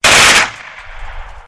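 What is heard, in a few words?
shot from rifle M-16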